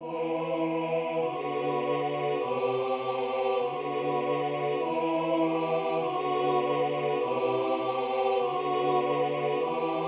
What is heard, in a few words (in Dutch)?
Koor; Bajuschki